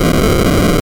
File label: random f880hz